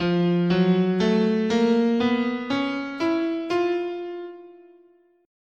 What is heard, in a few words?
F Persian, scale